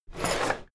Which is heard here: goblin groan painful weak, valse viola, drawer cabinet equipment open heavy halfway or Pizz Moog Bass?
drawer cabinet equipment open heavy halfway